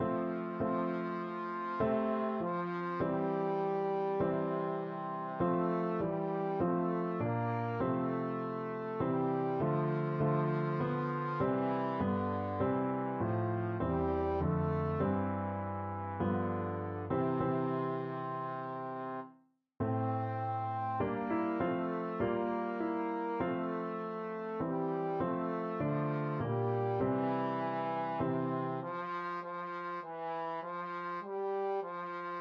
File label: trombone